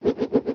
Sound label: Spin Loop; Baron